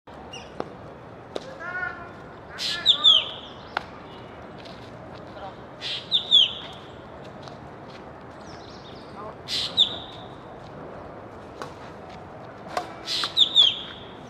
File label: Ashy drongo; بوچانگای خاکستری